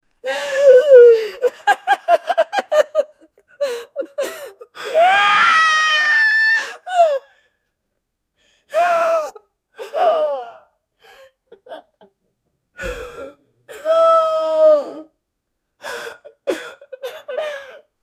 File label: young adult men hugging; human interactions